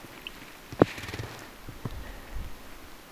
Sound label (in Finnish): jankakurppa nousee lentoon siipien aania